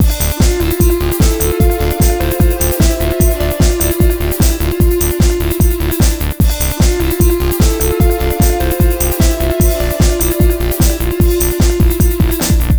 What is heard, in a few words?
violin; GROOVES